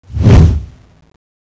swing, tentaculo; CSO KrakenBoss

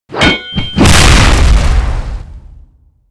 axe combo, weapons

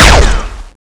crossbow, weapons

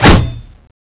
axe wall metal, weapons

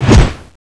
axe slash, weapons

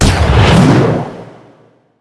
revive gun revive in

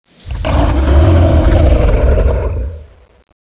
groggy end; CSO KrakenBoss

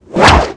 whip sword stab

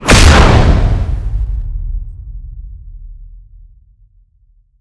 gun change fly exp, weapons

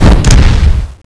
dash; titan

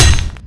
chainsaw wall, weapons

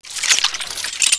void pistol draw; weapons

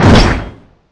spear end shoot, weapons

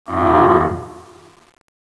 idle, tentaculo; CSO KrakenBoss

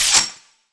spear charging start, weapons

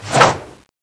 knife stab

